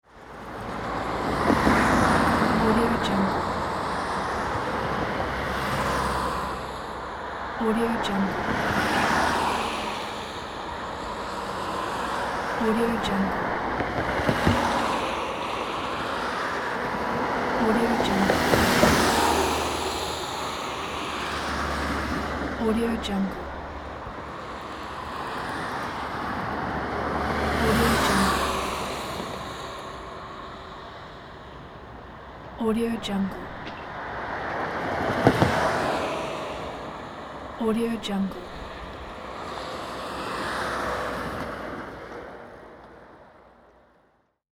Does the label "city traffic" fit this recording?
Yes